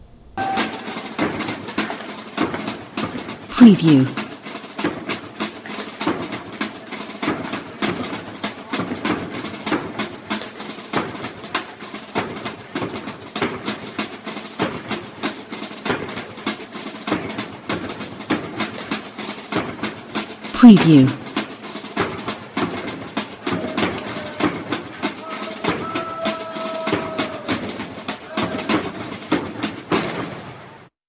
Military Cadence; long

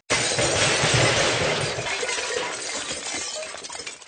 Crash

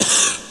wheeze

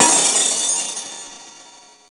glass shatter

